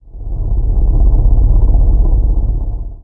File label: earthquake